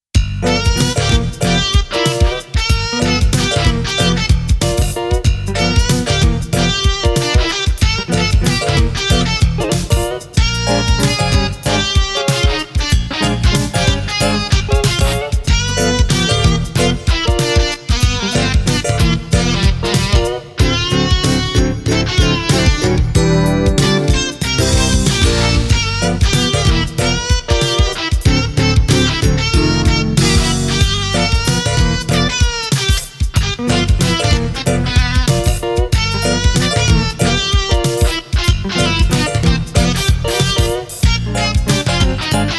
Roland SC-88

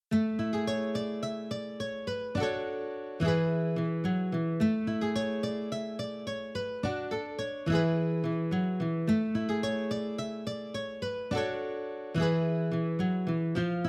B3, XV-5080 Fantom-XR; Remix

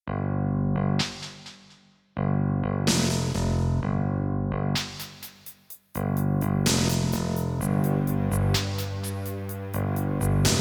SC-55mkii

